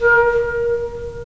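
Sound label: wind clarinet; LA Composer vol. 3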